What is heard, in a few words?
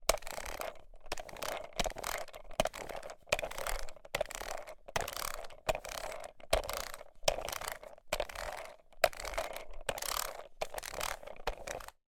footsteps rollerskates plastic across carpet floor; foley